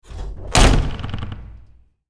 Ship door hatch close

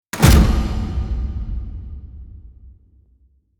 Stadium spotlight hit arena entrance

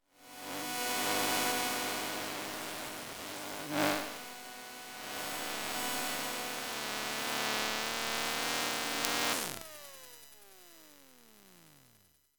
Robot vacuum electromagnetic textures

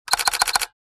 Professional camera shutter multiple shots

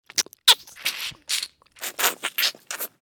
Vampire sucking blood feeding

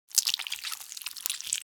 Cartoon slime wet bubbles squelching

